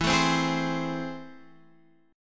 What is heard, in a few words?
A Minor Major 7th, E